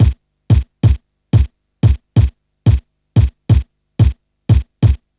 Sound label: Kick Loop, Drum & Bass; Construction Kit